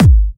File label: Bassdrums UK Trance Kicks; Vengeance Samples